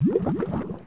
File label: water el; dungeonmaster